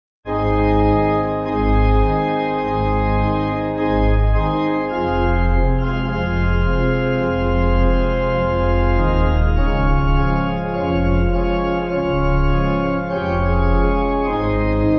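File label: Pipe, hymn backing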